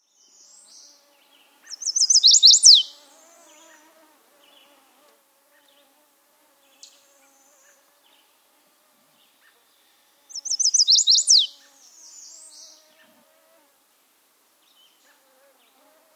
Chestnut-sided Warbler

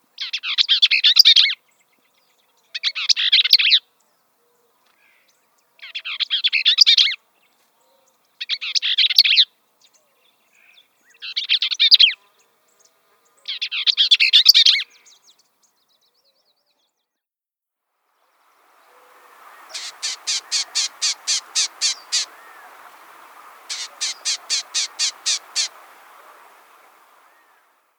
Bell's Vireo

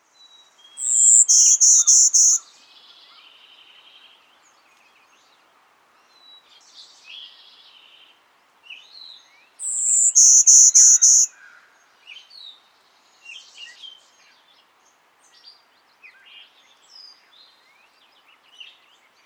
Golden-winged Warbler

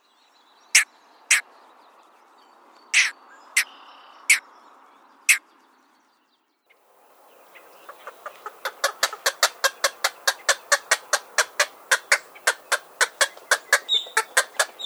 Green Heron

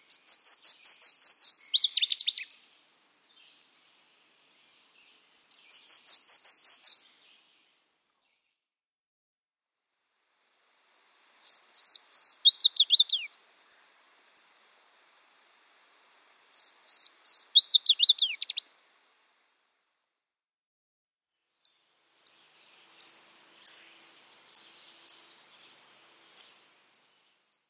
Louisiana Waterthrush

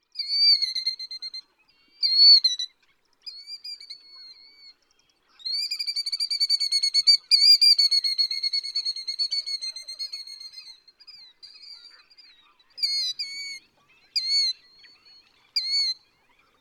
Killdeer

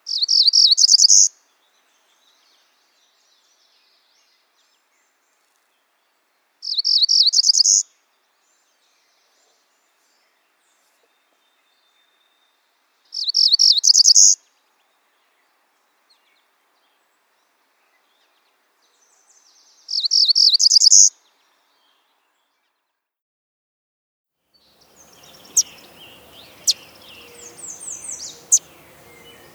Cerulean Warbler

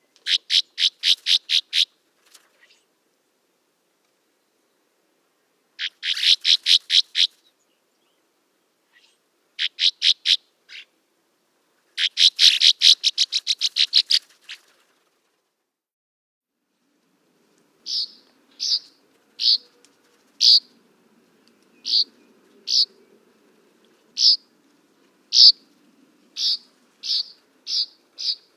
Northern Rough-winged Swallow

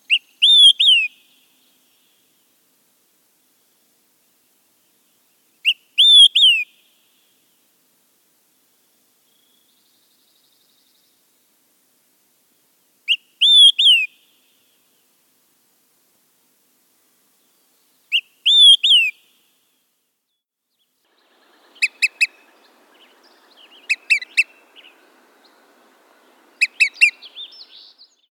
Olive-Sided Flycatcher

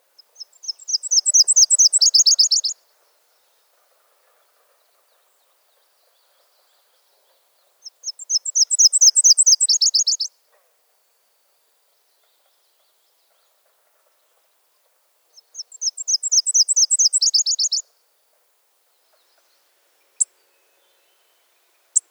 Nashville Warbler (Eastern)

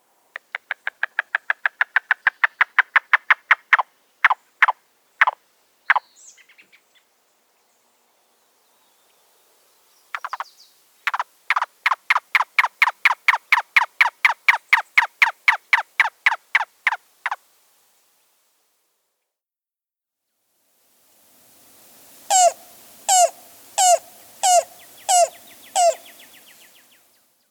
Yellow-billed Cuckoo

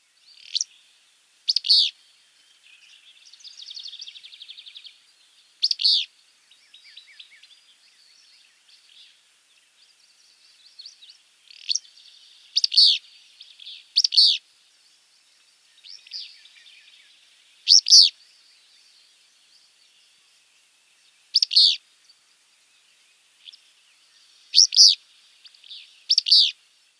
Willow Flycatcher (Eastern)

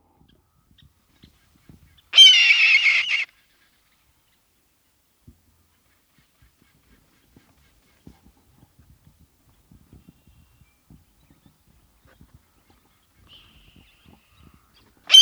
Red-tailed Hawk

